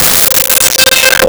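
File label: Guitar Harmonic C5; Nintendo; July 2020 Gigaleak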